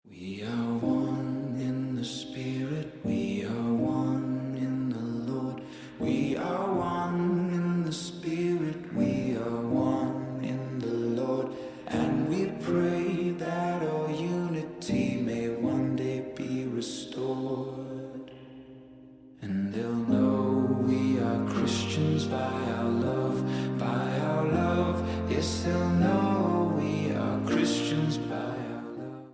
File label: christian ringtone